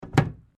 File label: Cardboard box hit; Material components for constructing more complex sounds; Materials